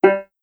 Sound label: Rhythm Whoops; super crazy rhythm castle